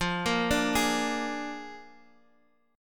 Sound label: A sharp 7th F, Guitar